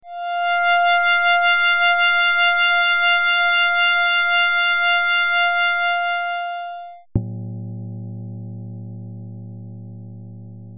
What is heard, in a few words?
synthetic